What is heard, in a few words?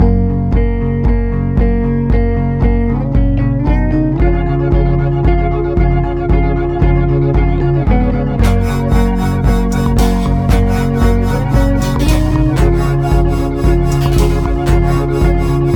Beautiful Electro